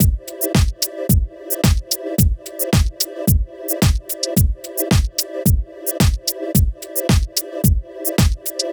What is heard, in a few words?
rhythmic